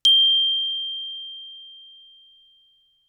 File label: bells plastic ff G7 stereo; Percussion